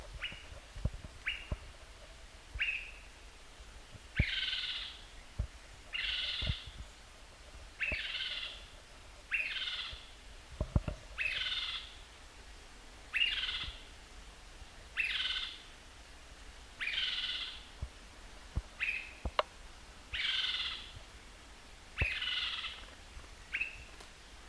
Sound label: Swainsons Thrush Catharus ustulatus; thrushes thrashers